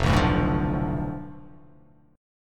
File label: F 6th Flat 5th F down, chord